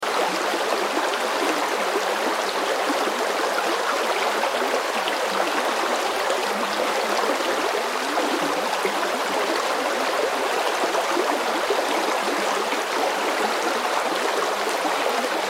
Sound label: Creek Shallow, Water Shallow Flow Current Loop; Rivers; Nature; Blastwave